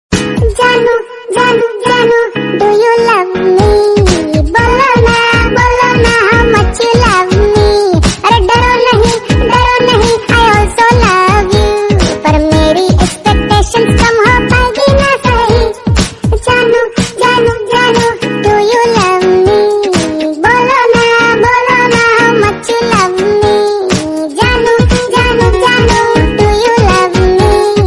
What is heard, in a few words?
hindi funny song